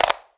clean clap one shot e key; single hits one shots

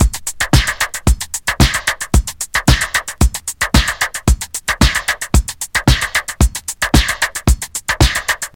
112 bpm drum beat d sharp key

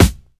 sharp steel snare drum sample g key; snare drum samples

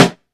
dope snare sound g sharp key

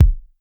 rich lows steel kick drum sample C key

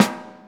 snare g key; Duration 0:01; Samplerate 44100 Hz; Channels Mono; snare drum samples; drum single hits one shots

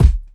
good kick sound c sharp key; kick drum samples

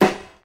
dope snare sample f sharp key

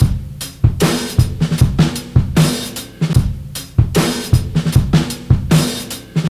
76 bpm drum loop e key, kick tuned to the E note

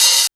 tamed closed high hat sample c key; tuned drums

